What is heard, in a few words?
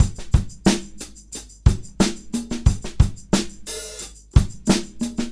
Combo, R&B Drums; Sonic Foundry (Sony Creative Software) - R&B Drums